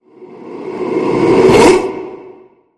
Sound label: Fan Shout Suckback